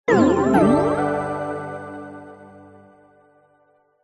multimedia game tone short positive complete

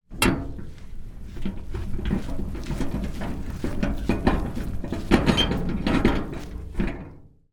household metal wheelbarrow push around on grass

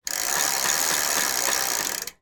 transport bicycle back pedal

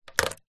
technology headphones set down wood surface

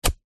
metal shovel spade single dig grass soil

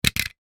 toy car die cast drop on floor; sound effects one

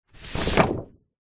hospital xray sheet slide out of envelope

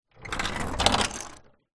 household gate wooden rolling roll short

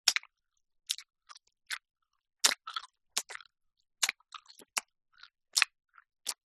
human eat chew gum noisy

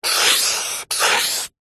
office pen felt marker single stroke on paper

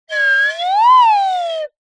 cartoon slide whistle double pitch up down

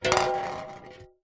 foley tin metal cylinder lid on roll on concrete; zapsplat

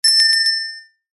bell small ring close clean shop door bell

